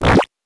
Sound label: randomize, 8 bits